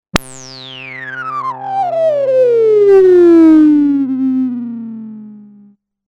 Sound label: punch rezo